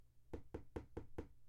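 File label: knock knock with a small knife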